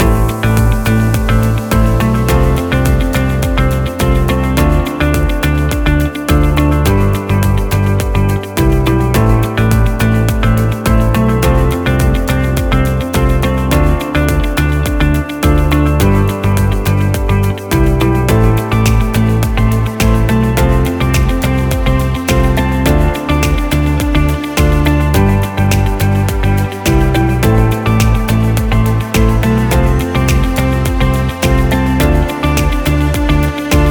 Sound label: Inspiring Aesthetic loop